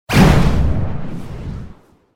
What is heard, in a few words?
twisty hit whoosh; overte; partyBall